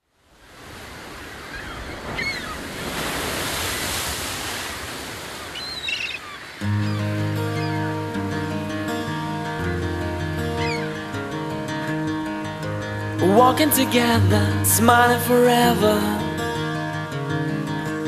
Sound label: unplugged ocean Version